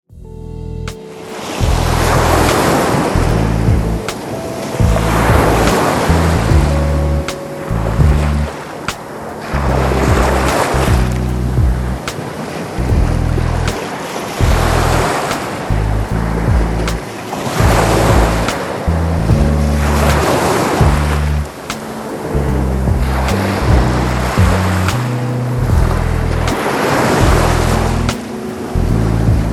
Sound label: Beach Relax Loop; Nice